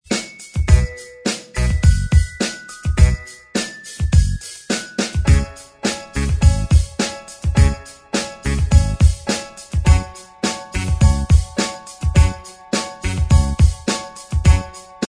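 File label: instrumental track, backing tracks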